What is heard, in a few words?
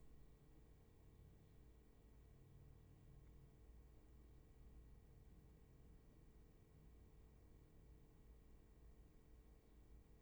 roomtone